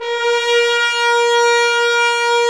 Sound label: BRS BNSF2XR, Roland LA Composer, Tbns; 90 s Sample CDs